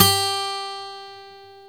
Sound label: A GT G3B; AKAI S-Series CD-ROM Sound Library VOL-7; A GUITAR 1